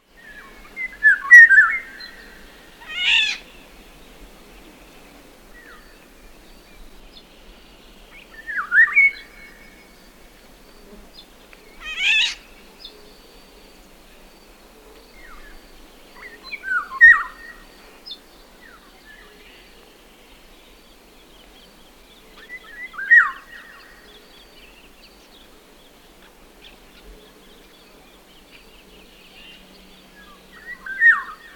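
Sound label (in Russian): ivolga, golden oriole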